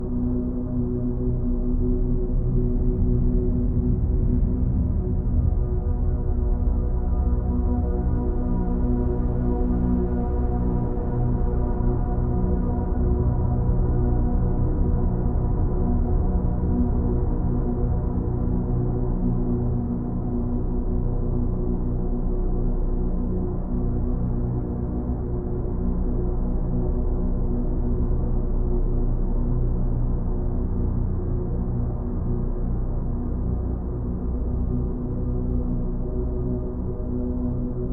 Base Loop; bramble the mountain king; ps4 ps5 switch windows xbox one xbox series xs

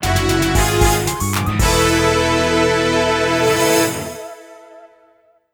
Golf - Give Up; Super Monkey Ball 2

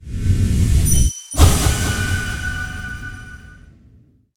hero dream nail slash

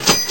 ticket payout

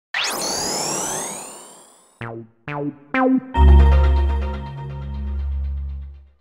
Logo (Sony PlayStation Version); Bandai

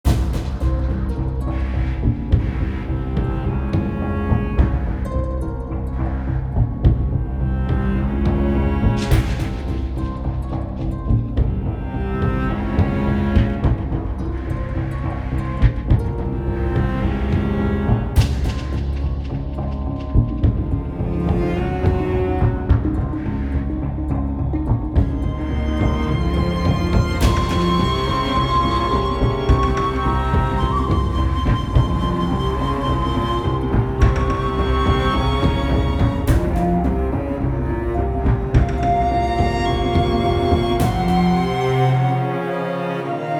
Instrumental; far cry 2

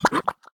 Mud Gulb; Zombie Catchers

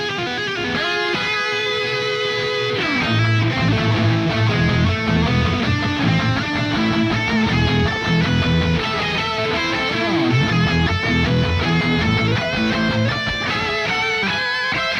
Music Transition Guitar; GnomeGnight

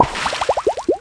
Explode

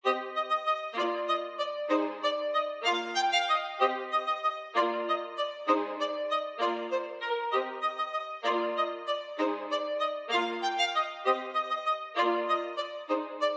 generic loop

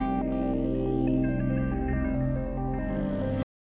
Dark Intro; Metaverse Keeper

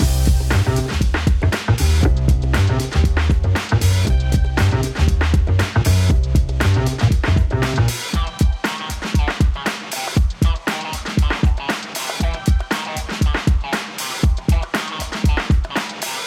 90 hiphop 118bpm; hot lava; ios macos windows

